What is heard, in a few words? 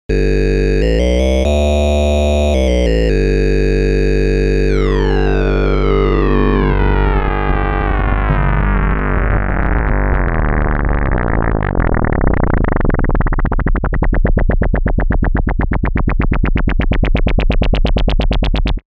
Audio Rate FM Filter Arturia Modular V3